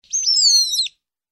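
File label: rat squeak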